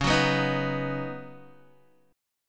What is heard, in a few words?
Db 7th Suspended 2nd Suspended 4th, Guitar; Guitar-4F,4A,5C,5G,5C,6E; Db7sus2sus4 strummed